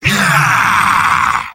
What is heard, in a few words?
battle cry; sniper; mvm